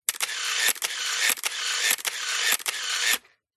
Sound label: camera film 35mm slr canon a1 shutter release 1 15th with canon motor power; mkh8060; winder; pmsfx